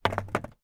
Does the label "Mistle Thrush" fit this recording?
No